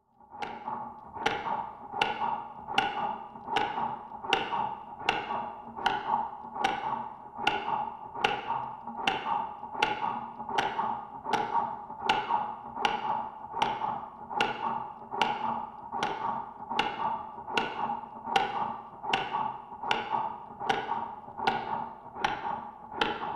Heart Simulator; Interior, Muffled, Run Steady In / Out Breathing With Light Bellow Inflate / Deflate; heartbeats